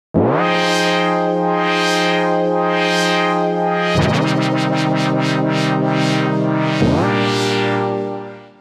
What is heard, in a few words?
LEAD Dim